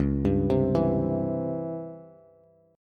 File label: Db Minor Major 7th Flat 5th Db down Bass; DbmM7b5 arpeggiated; JGuitar